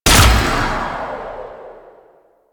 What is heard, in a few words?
Archer Rain Of Arrows Fire C; Warframe; Duviri; Duviri Dax; Abilities